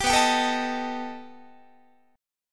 B Augmented 7th B down Guitar; B+7; strummed; 22,19,22,19,19,20

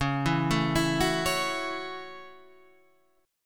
F sharp Minor 7th, guitar

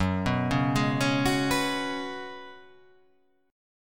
F sharp Suspended 2nd Suspended 4th

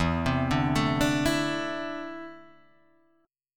E 7th Suspended 4th Sharp 5th, Guitar, Drop D

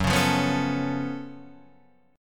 Gb Minor Major 11th Gb down Guitar Standard; GbmM11 strummed; JGuitar

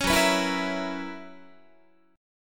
F sharp 11th F sharp Guitar; F#11; strummed

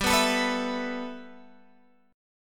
G 6th Add 9th, Guitar, Open G

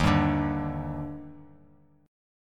Eb Major 7th; EbM7; strummed; JGuitar

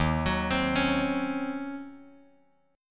D sharp Minor 13th D sharp Bass Standard, chord; arpeggiated; JGuitar

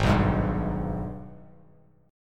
Ab Minor Major 7th, Bass Standard; strummed; JGuitar

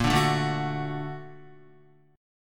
A sharp Augmented Major 7th A sharp Guitar; A#+M7 strummed; JGuitar

